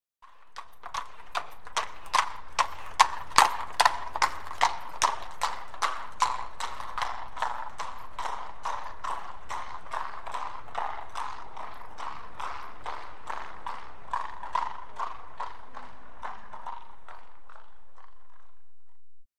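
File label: Single Horse Trots By On Cobble; Historical; Hollywood Edge Historical Series; War Horses Armies & Weapons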